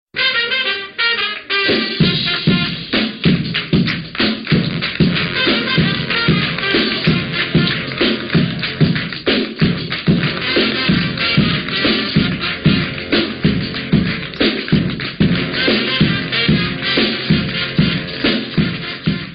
Zoozoo drum

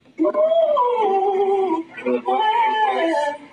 vocals

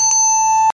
Sonic Water Chime

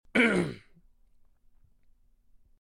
Clear Throat

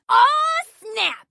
snap; Gaige

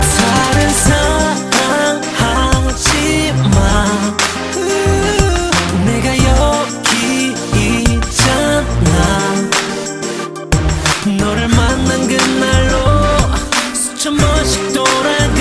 Trap

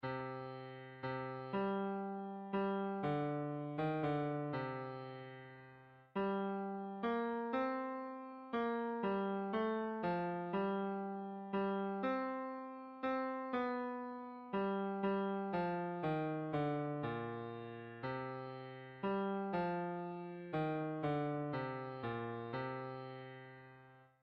Ring Tones

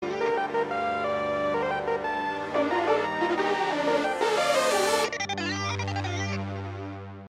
Phase Tone

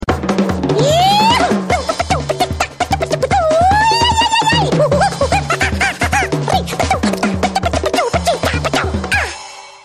Samba Mouse; meloboom

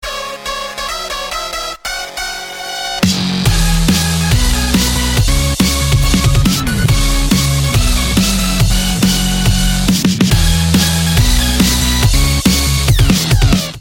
Breaks

